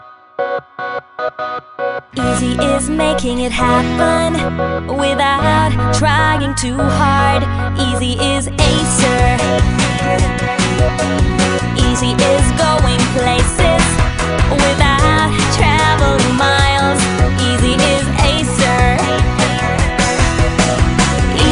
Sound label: Is Calling RNB